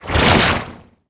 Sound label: Teleporter Attack Slash; Evolve; Wraith